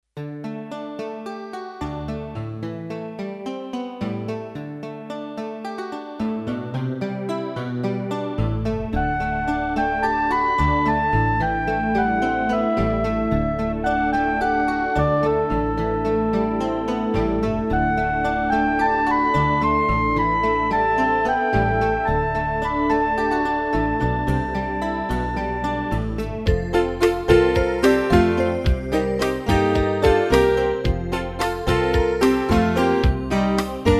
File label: Folk, Roland D-10; demo; Factory Demos